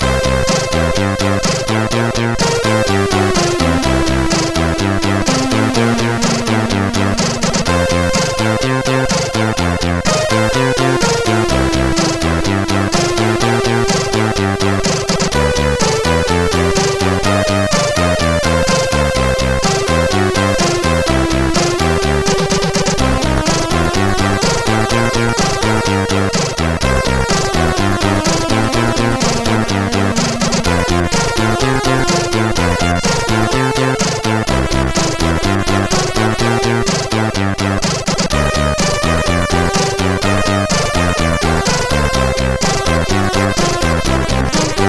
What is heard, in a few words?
Blues, CSG8580R5; sid